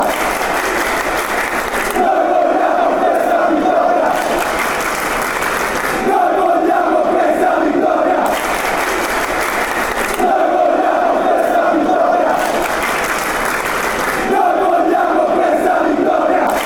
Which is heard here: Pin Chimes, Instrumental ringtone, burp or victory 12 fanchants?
victory 12 fanchants